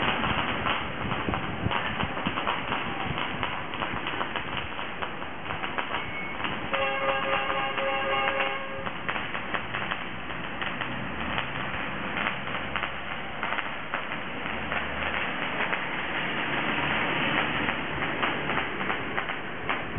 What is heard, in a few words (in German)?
Kracher